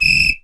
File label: Whistle Long, Perc; Percussion Kit